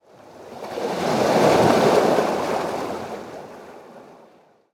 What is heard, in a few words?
waves, Ice Fields